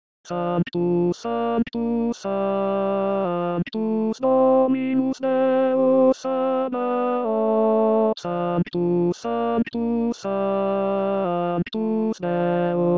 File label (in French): sanctus tenor; st jean